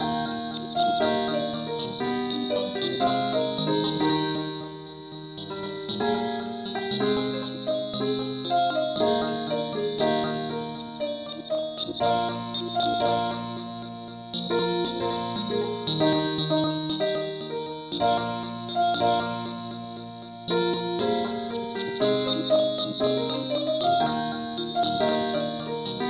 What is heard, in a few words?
keyboard only key ees